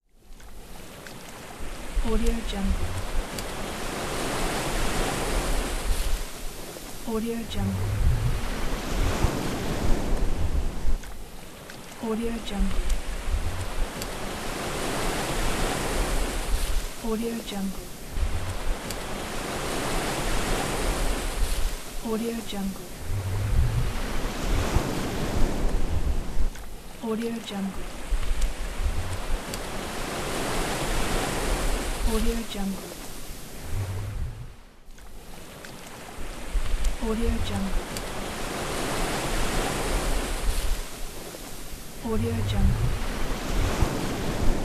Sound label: beach; erhanbicici